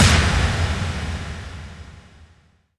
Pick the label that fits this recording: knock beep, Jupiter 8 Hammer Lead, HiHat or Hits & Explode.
Hits & Explode